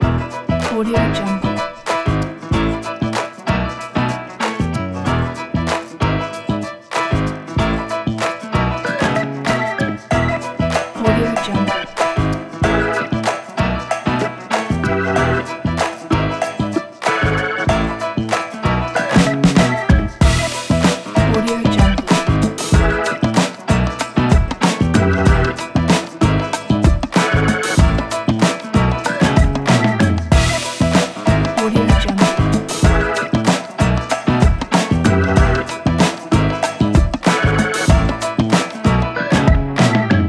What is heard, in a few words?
happy day funky gospel